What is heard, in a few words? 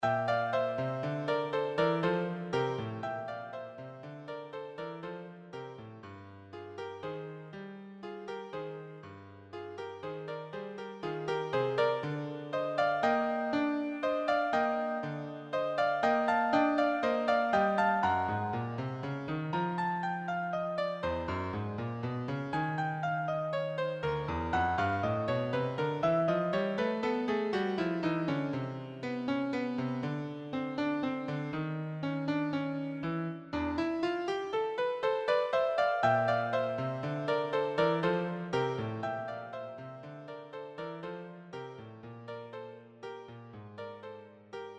piano